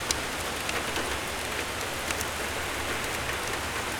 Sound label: rain, Nature; haptics; com.meta.xr.sdk.haptics; HapticPacks